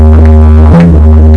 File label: toot, didjeridu